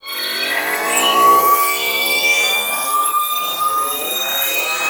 Wailing Charge; Warframe; Teralyst; Vomvalyst

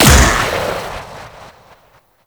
Shot, Infested Dart Pistol; Coda Tysis; Weapons

